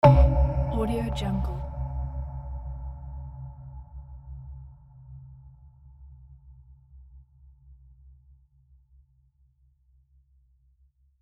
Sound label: impact effect